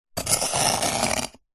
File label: nature ice block sharp knife scrape